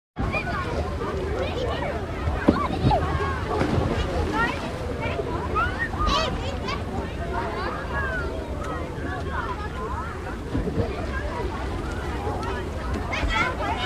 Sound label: Outdoor Swimming Pool; Sound Ideas; Series 2000